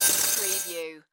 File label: Digital Sweeper Warble Swell